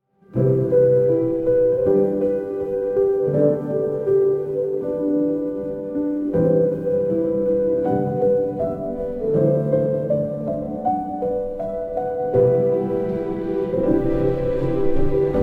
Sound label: music